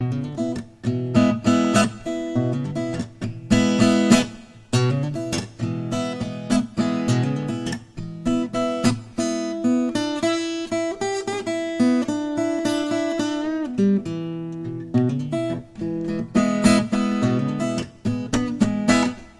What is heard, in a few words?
Open D Capo 2